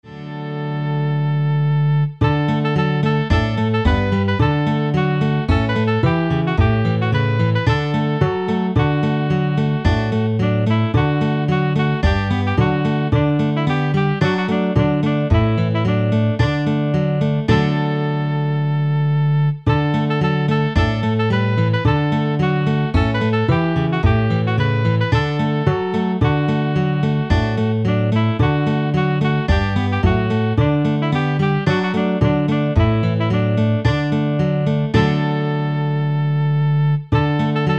rusariu da mmaculata chitarra; canti madonna

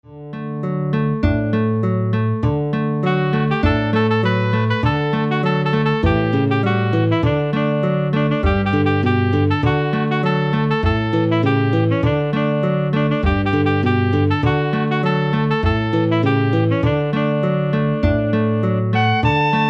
chitarra; canti madonna; rusariu da madonna do carminu

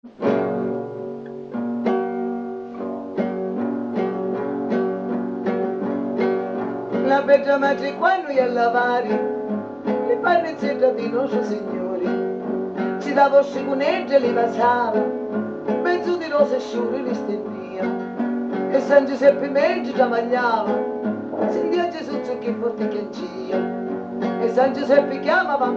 filastrocca a lu bamminu; canti madonna

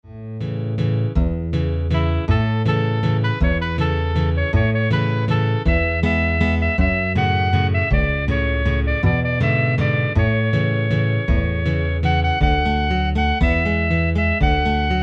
Evviva Maria di l Assunta chitarra; canti madonna

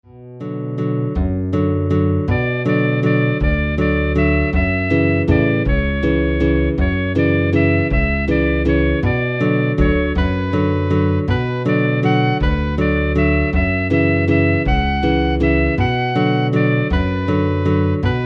diu ti salvi regina dialetto chitarra; canti madonna

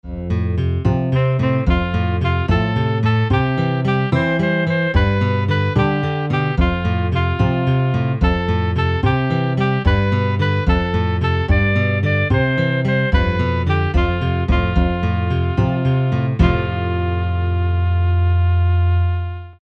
rusariu da madonna do vausu chitarra; canti madonna

